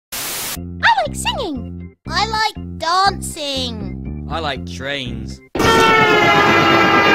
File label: trains booop